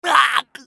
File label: scav wound; eft